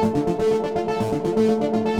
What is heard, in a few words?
bass; recreational research foundation (lines)